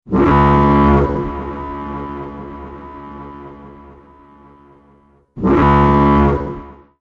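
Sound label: Self Destruct Alarm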